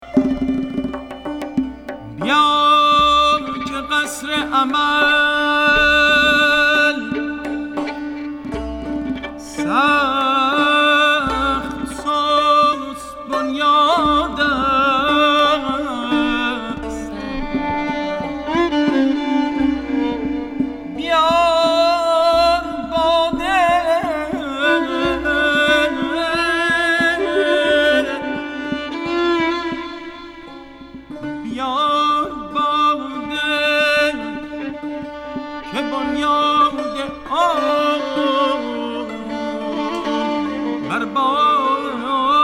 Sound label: Avaz Mokhalef Segah